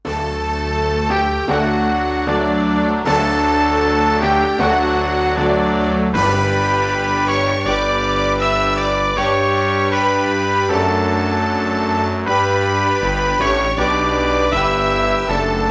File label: hymn, Armenia; Symbols